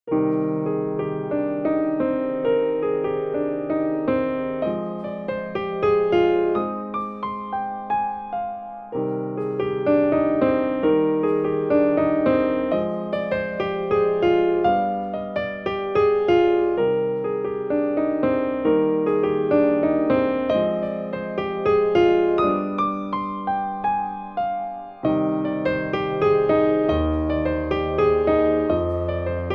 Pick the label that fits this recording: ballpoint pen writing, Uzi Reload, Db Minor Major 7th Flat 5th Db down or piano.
piano